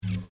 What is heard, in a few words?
MENU ERROR; midnight club LA